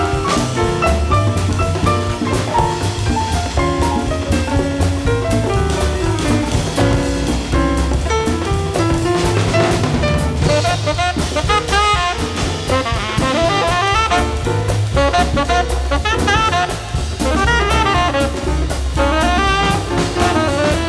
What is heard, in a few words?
jazz